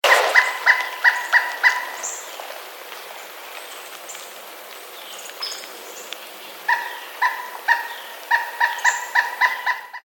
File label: Pileated Woodpecker